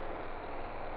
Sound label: reel Spin; netent; reelrush